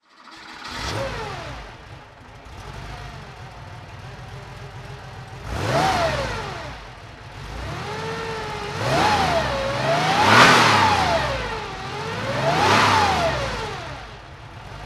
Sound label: porsche 911 30 SC throttle